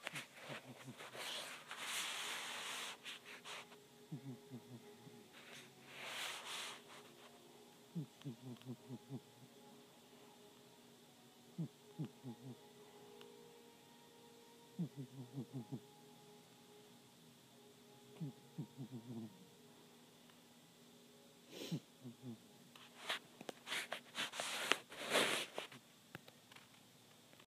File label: Snoring